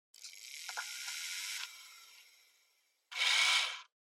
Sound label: Portable MD Driving Noise Stop